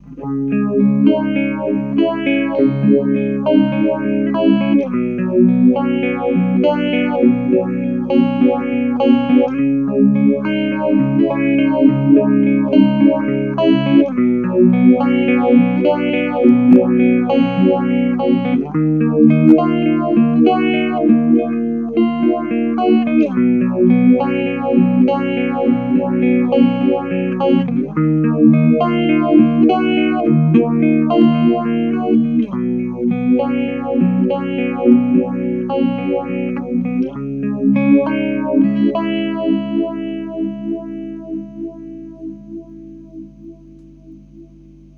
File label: phaser